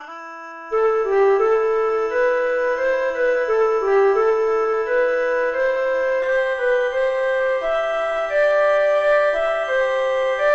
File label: midi; rassemblement-ateliers; NadalEncara